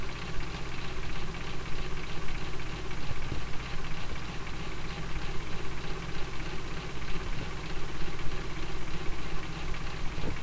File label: Computer Noise